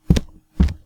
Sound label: Two Footsteps